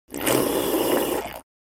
Slurp Very Long Close Perspective; Human Sounds

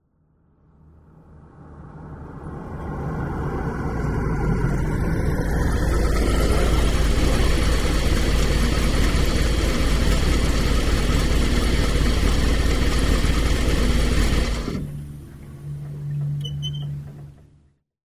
Dodge Van Drives In, Stops; SOUND EFFECTS1

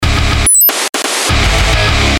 Punchline, Stabs & Bursts; Best Of N2O Library

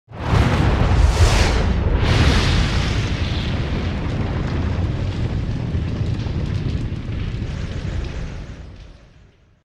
Fire - Multiple big flare bys; Hollywood Edge Sound Designers Tool Kit

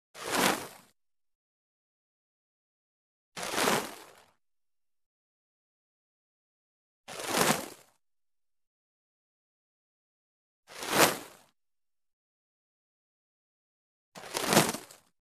Arrow Bys With Fire Sizzle Arrow Medium Sizzle By; Hollywood Edge Foley Sound Library

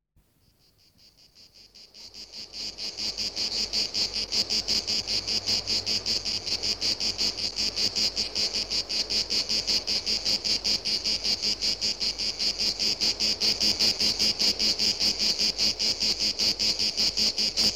Cicadas; SOUND EFFECTS1

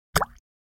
Drip Single Falls into Liquid; water

